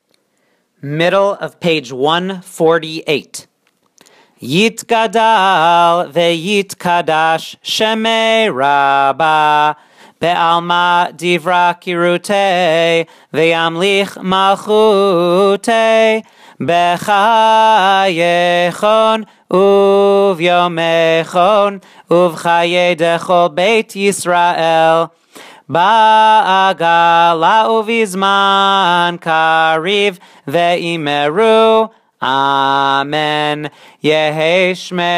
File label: chatzi kaddish